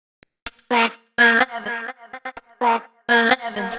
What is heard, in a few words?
126 Bb VOCAL LOOP, Funky Underground; Loopmasters; Funky Underground Vol 2